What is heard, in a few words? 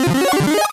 Shield Item Pick Up Sound Effect; triangle adventure v1.0 unofficial soundtrack